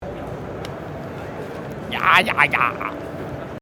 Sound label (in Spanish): jajaja